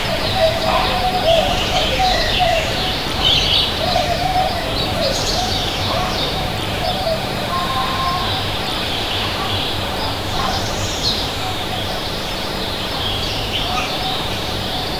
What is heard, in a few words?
village morning, birds chirping